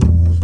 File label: Upright Bass Notes; multisampled